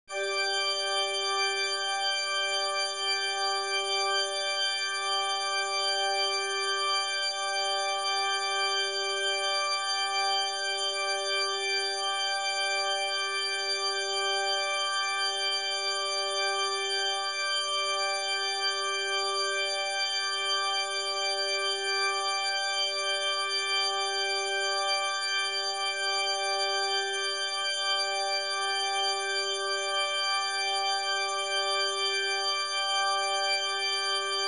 G Drone

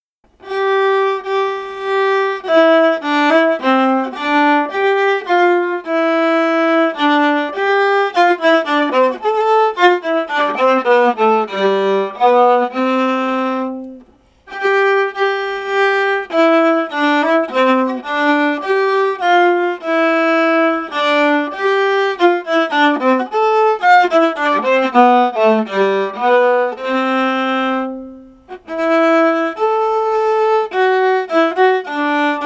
Viola Minuet

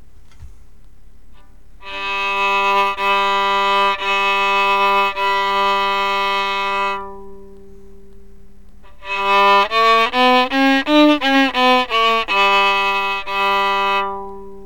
before bridge change, fiddle; new bridge

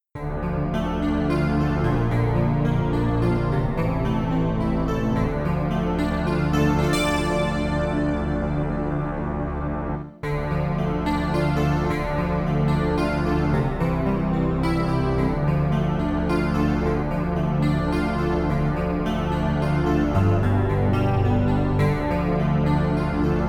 Scarborough Fair Bass 8va

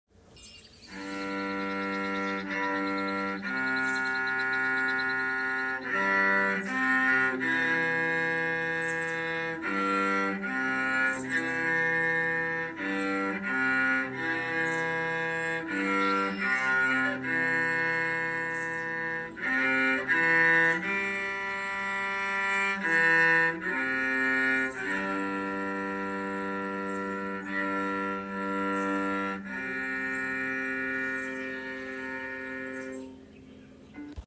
Taps Cello

